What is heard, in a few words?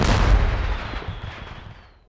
firework explosion